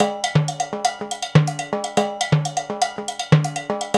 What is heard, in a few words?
African Contemporary, Mozambique; Spectrasonics; Supreme Beats